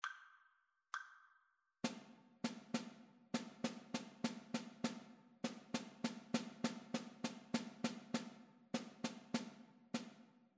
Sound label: rhythmic dictation; ear training